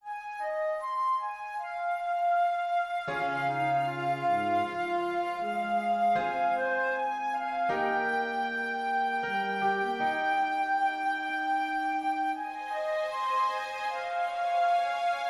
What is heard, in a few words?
No Percussion